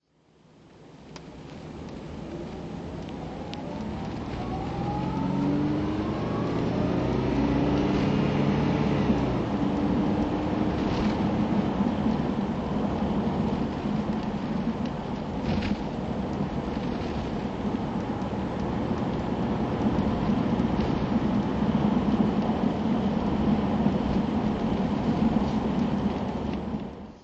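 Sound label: jaguar xj12 driving, Engine sound driving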